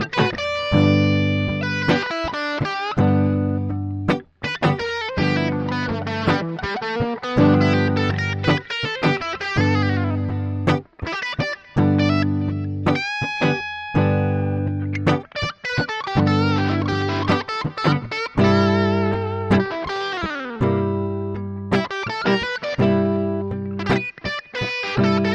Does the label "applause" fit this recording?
No